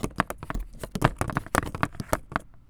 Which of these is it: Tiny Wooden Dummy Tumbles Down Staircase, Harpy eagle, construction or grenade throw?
Tiny Wooden Dummy Tumbles Down Staircase